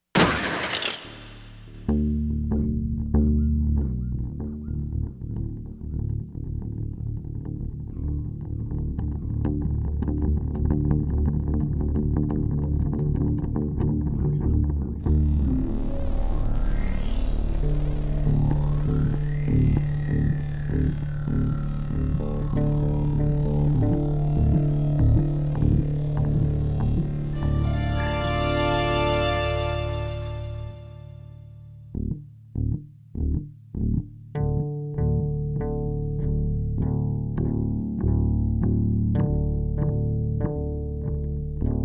crash

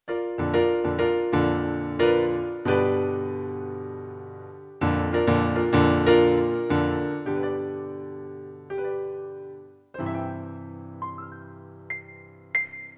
Piano

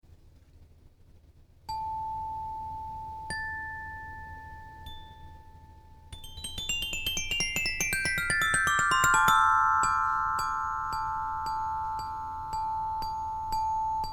xylophone

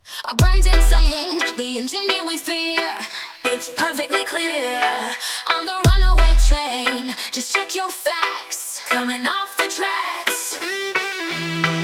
Reggae; MegaEpix

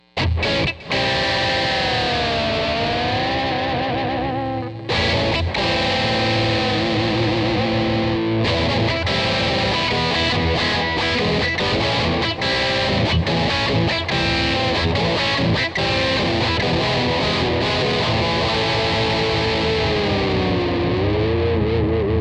electric; Drop Kick

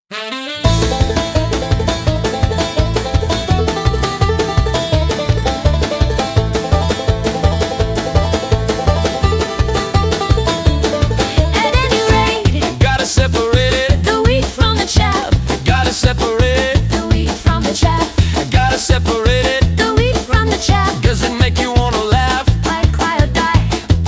Unplugged

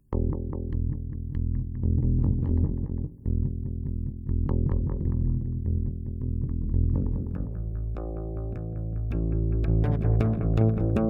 bass; MegaEpix

